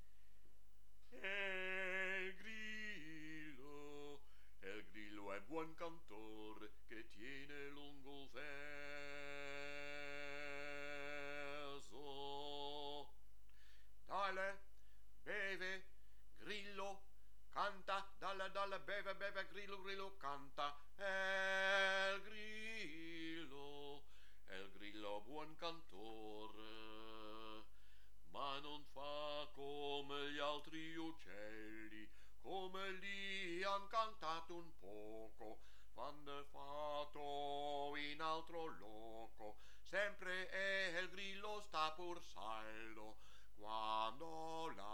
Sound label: el grillo bas